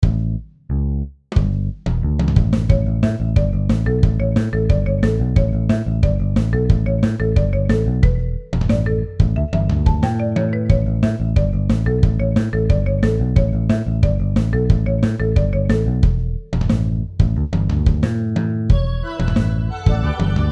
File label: summer hit